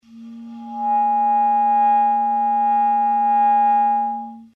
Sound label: C4 w A sharp 5, clarinet